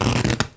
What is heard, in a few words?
spark